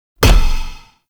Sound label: Bash Hit; Warframe; Warframes; Hoplite